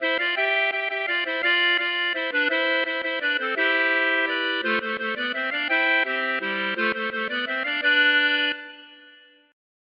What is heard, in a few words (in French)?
valse